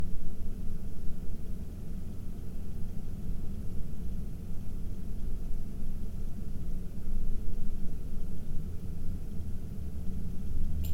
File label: Alphacool Rise Aurora 1000 RPM